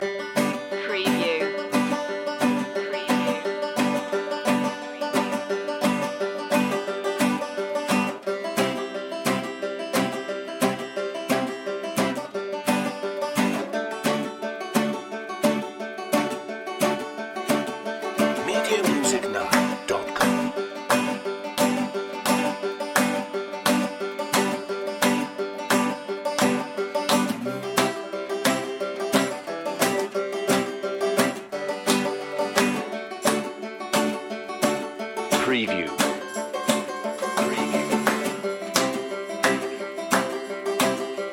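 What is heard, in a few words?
guitar banjo percussion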